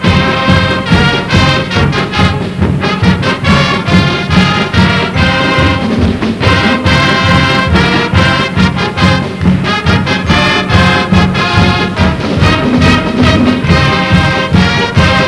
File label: trojan Fight song